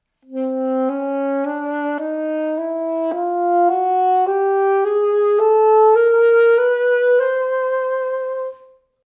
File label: Chromatic Scale C C